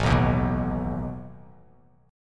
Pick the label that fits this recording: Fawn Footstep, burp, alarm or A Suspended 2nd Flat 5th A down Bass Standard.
A Suspended 2nd Flat 5th A down Bass Standard